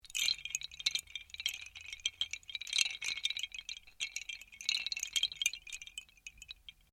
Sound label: Windchimes Bamboo Small Long; Various windchimes; Musical